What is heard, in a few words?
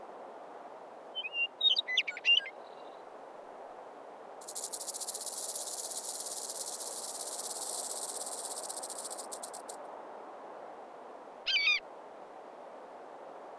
Ambience loop; Jessie's Wild West Rodeo